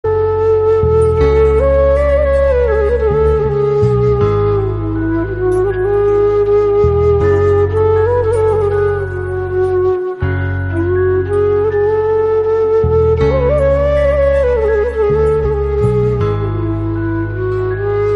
FLUTE RINGTONE

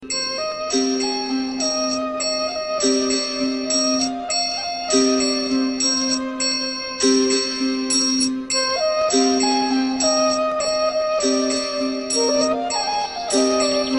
BANSURI RINGTONE

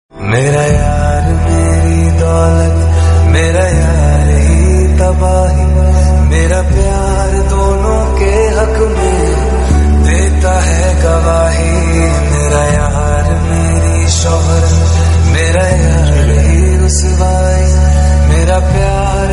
ringtone male version

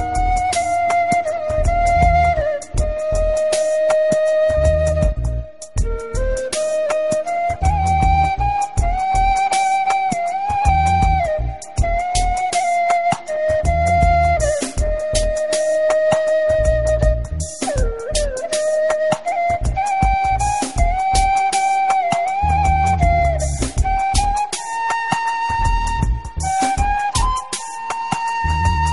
FLUTE RINGTONE

